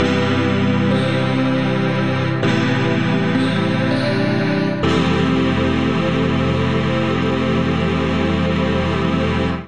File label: A Minor 99 bpm; Accompaniment; Tonal; MIDI Clips; Subtle Lightwaves; Drive and Glow; Ableton; Factory Packs